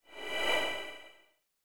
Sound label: Equip Equipment; SFX